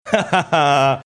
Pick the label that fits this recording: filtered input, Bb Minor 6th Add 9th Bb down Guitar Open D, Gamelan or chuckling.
chuckling